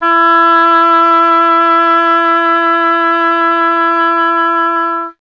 WND OBOE, Solo Instruments

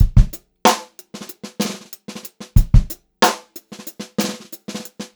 groovy beat, Funk-Groove Drumloops; USB Soundscan vol.38; AKAI

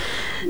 NOISE, CLASSIC VOX; Best Service; Hallelujah

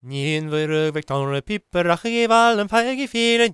GAELIC, World Voices; ILIO; Vocal Planet

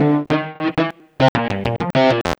Metafunk, Leads And Riffs

